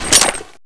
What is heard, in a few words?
ui buy Grenades